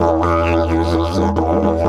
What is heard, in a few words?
E 130, Didgeridoo